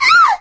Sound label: pickup; jailbreak